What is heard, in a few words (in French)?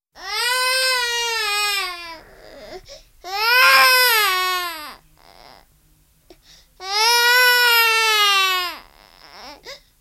pleurs de bébé